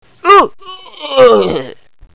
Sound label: hostage death; cstrike